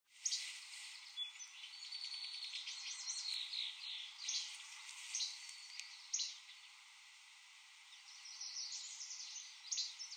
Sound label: Least Flycatcher Songs